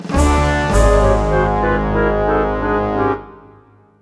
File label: Race Lose Jingle; Nicktoons Racing